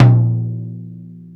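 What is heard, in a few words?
jazz drum tom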